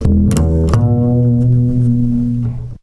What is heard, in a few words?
Double Bass F; Total Drum&Bass